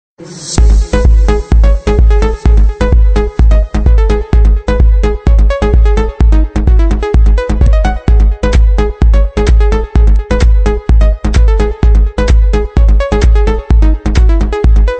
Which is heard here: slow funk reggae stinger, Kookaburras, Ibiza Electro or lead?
Ibiza Electro